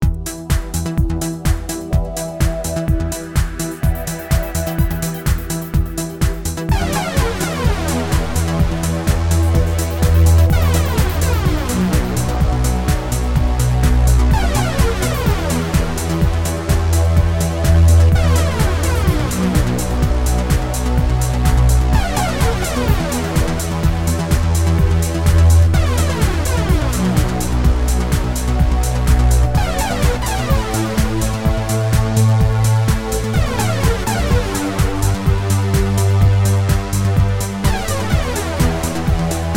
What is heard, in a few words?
Laser Harp; Demo used with a COMBI; KORG OASYS; oasys assault